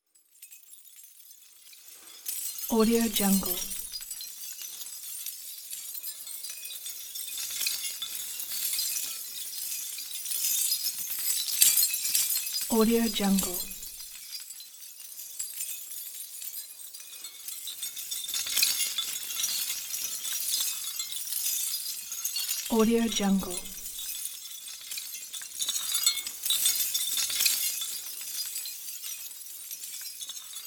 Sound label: glass shards; rhumphriesdc